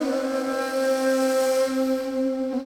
SIERRA FLU

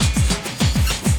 loop, 200 BPM; Drum & Bass; Ueberschall; Drum & Bass Resonance

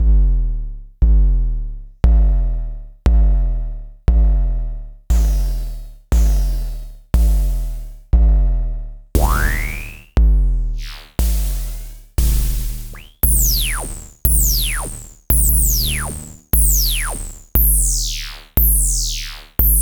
Klone Dual Percussion Synthesiser SFX

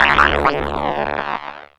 Static 180, Hard & Loud Techno; USB Soundscan vol.01; AKAI

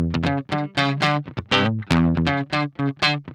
GUI, licks; 120 LICKS

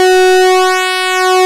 PULSEMOD, ARP Synths; E-MU Formula 4000 Series Vol. 3; Analog Odyssey; ARP Synths II

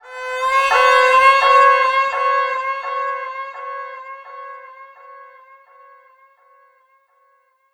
VIOLINS FX; USB Soundscan vol.60; Asian Traditions; AKAI; VIOLON FX

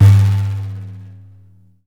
BENDIR; Deff Bendir &Req

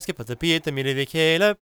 GAELIC, World Voices; ILIO; Vocal Planet

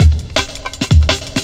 Cut, breaks

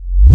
Rev Kick; Mo' Phatt; Digital Sound Factory Mo' Phatt

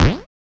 FX, ANALOG; Sound & Vision - Gigapack II

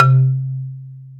XYLO C 2; AKAI S-Series CD-ROM Sound Library VOL-7

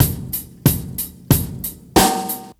Chillin beat 93bpm; Sonic Foundry (Sony Creative Software); Hip-Hop Street Beats Construction Kit; Street Beats by Poogie Bell

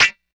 scrape, bizarre guitar; Spectrasonics

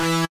Stabs; Akai MPC-5000; Internal Rom 2

